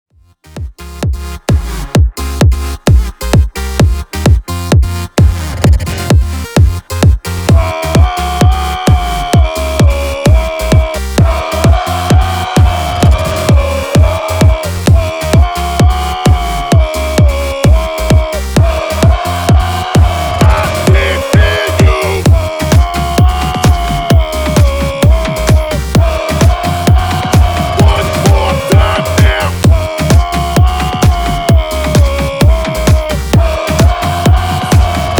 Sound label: Hype Intro 130 Bpm